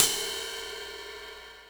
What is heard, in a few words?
CYMBAL, Yamaha RM 50